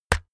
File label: keyboard external key hit push